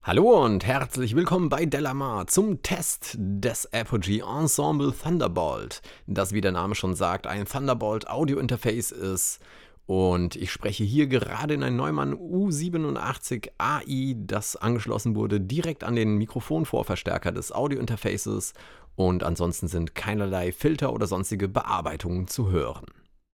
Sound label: apogee ensemble thunderbolt vocal